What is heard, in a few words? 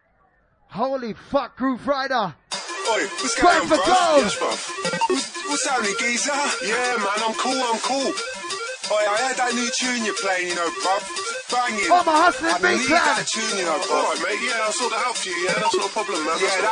DNB